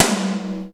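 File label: POWER KITS, tom; 90 sSampleCDs; Crypton - DrumBase5000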